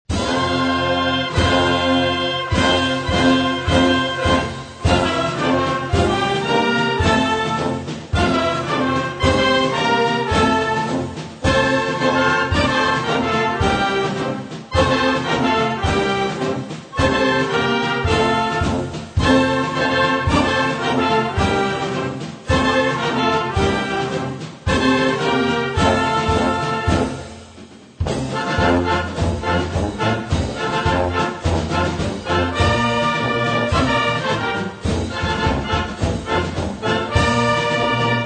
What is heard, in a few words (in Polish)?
marsz intriada